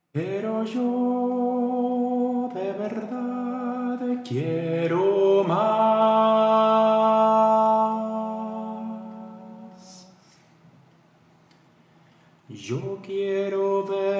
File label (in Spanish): sirenita parte soprano; LA SIRENITA